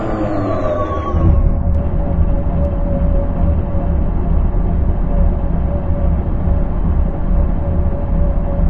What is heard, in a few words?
apc slowdown fast loop, vehicles; Source Engine; video game